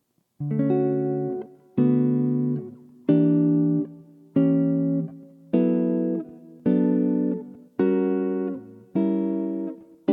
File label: Maj 6th and Dim Chords; borrowing on 2nd; jazz chords; Barry Harris harmonization